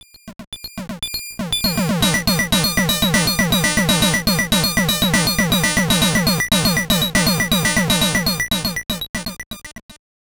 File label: Introduction Jingle; cocoron; nes